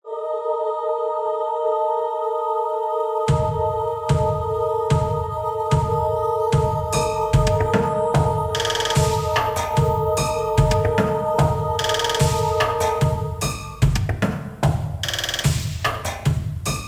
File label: Music, Escape; Five Nights at Ronald's